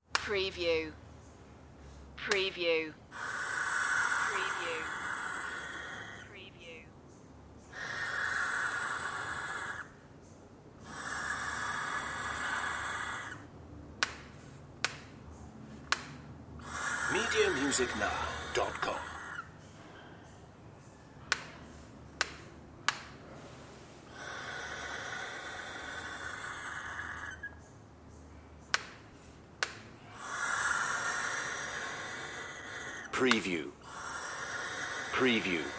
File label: owl hiss